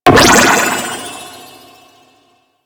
Prism Bounce; Warframe; Harlequin

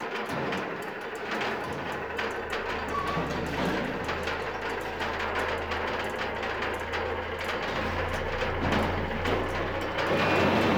Labs Int Tech Crossing Teleportation Device; Warframe; Ambience; Entrati

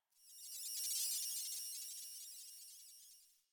Computer Room Ambient Beeping

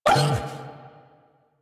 Feral Vocal Pain; Warframe; Kubrow

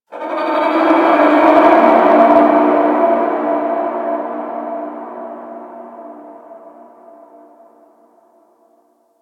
Ambience Hub Red Veil; Warframe; Tenno

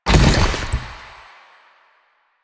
Collision Fast, Motorcycle

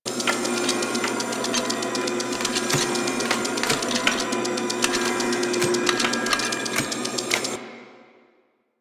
Scrap Container Dome Loop; Warframe; Missions; Zariman; Zariman Assassinate

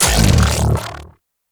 Mech Start; Nechro Mech; Vehicles

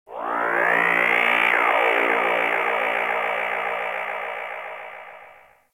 Ship Data Low Drones; Ambience; Warframe; Corpus Ship Remaster

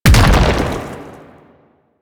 Hand Bomber Proj By Explosion; Warframe; Man In The Wall

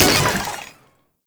Infested Spore Pistol Low Clip; Weapons

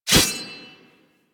Sword Swipe, Melee Whooshes; Warframe; Weapons

